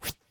swish; World Of Goo

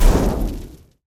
fire hit hard; CrossCode; battle

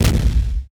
cannon; CrossCode; battle; vulturbine

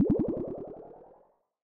blubb charge; CrossCode; battle

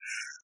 pda enter; The Swapper

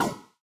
ball bounce cold; CrossCode; battle

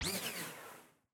hedgehog spin out; battle

